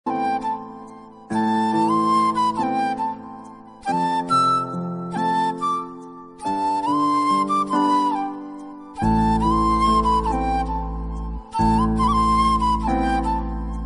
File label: flute instrumental ringtone